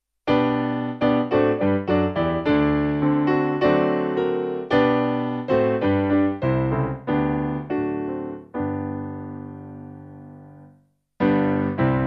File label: Cwm Rhondda Piano; Guide Me O Thou